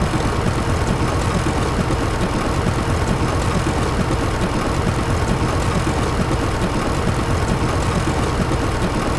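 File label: electronic plane propeller spin loop; anemoi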